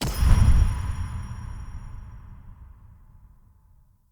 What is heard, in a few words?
Skill Tree Purchase Done